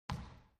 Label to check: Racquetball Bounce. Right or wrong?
Right